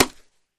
Boxing Glove Hit Slap

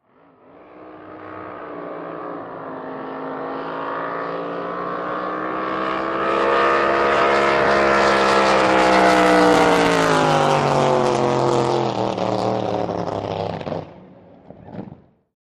Drag Boat Start by

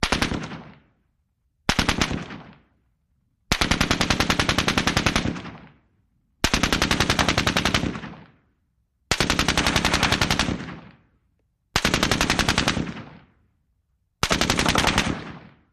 M-60: Long Burst With Bullet Impacts. Stereo

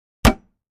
Gallon Jugs Strike To, plastic

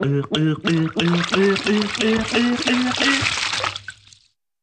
Funny Drowning Water

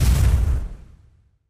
BIG EXPLOSION SHORT, fireballs

